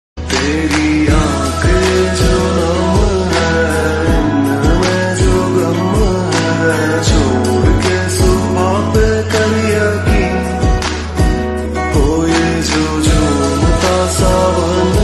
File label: sad love feeling ringtone